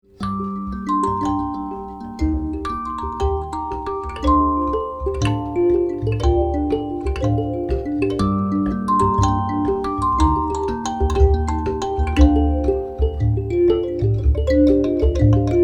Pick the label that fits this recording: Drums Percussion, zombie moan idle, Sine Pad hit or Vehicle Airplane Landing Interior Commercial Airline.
Drums Percussion